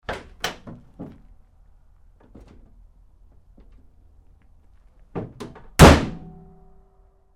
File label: Truck GMC Savana Door 2nd Rear Open-Close Hard; 2010 GMC Savana; Trucks